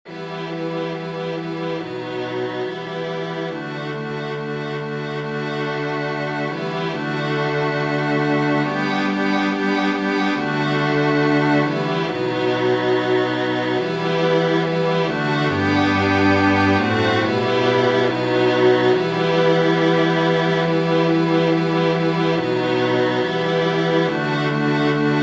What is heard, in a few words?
string quartet